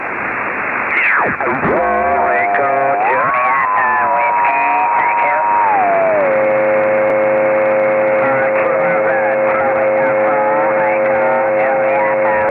linear transponder 145mhz931; xw-2e

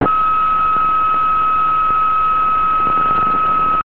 telemetry temperature; Simple telemetry downlink, audio frequency is a function of temperature inside satellite

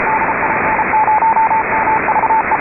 CW (USB); MOVE-II; 145,950; DP0MVE

